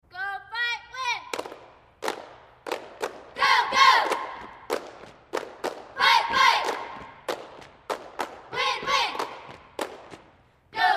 Cheerleaders High School; Cheer 7; Go Go Fight Fight Win Win, Exterior Perspective